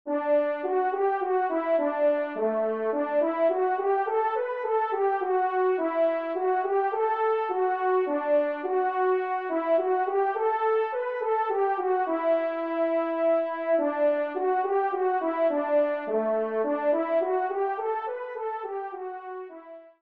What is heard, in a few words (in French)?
Menuet Do Maj TRP; 2e Menuet; Corrette